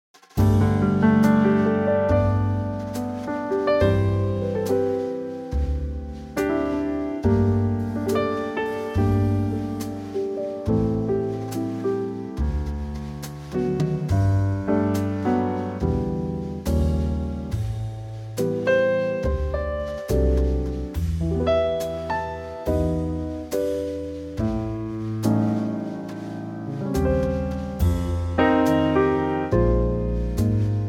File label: G Trio